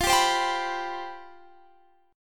C Major 7th Suspended 4th F

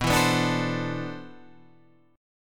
C Major 13th B

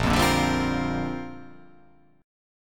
A sharp 6th Add 9th

